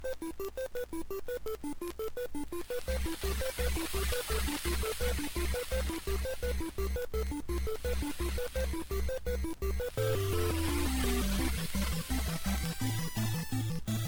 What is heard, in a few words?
8-Bit